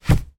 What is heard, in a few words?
car arm on arm rest; transportation; sound effects; sound effects one